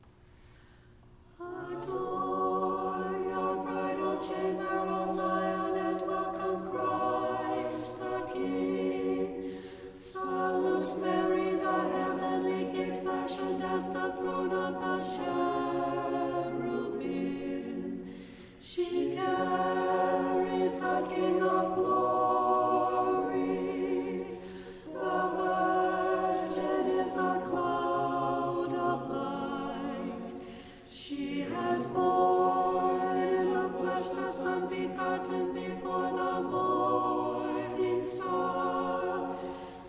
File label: Tone7 Kievan Chant Alto; chanting tutorial